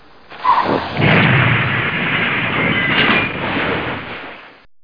Car Crash

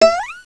Twang; Princess and the Pea; SoftKey

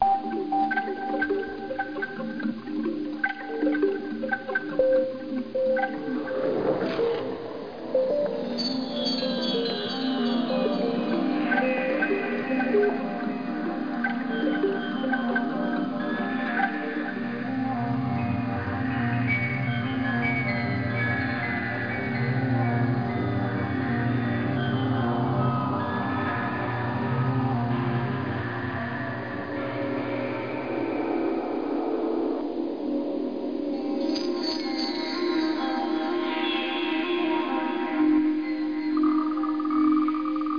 ambient

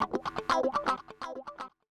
ELEC GUIT HOUSE 125 A, Guitar; eJay

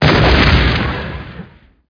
probe explode; MOTS

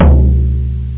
tom drum; drums

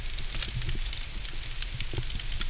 fire

